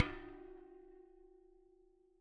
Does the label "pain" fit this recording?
No